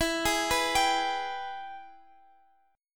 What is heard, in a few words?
Ab Minor Major 7th Sharp 5th